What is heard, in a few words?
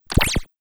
Cartoon warp